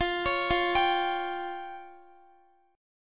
F Suspended 2nd Sharp 5th F down Guitar; Fsus2#5; arpeggiated; 4F,4A,5C,5G,5C,6E

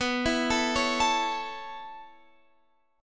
A Major B

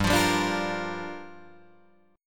G Minor 7th, Guitar, Drop D

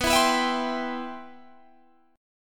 G 6th Add 11th B, chord; G6add11/B strummed

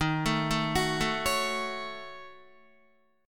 D sharp Minor 7th Flat 5th, Guitar, D#m7b5 chord; arpeggiated

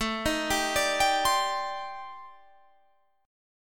C Minor 6th Add 9th A

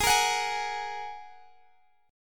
Ab 7th Suspended 2nd Ab; x,23,20,23,21,x; strummed

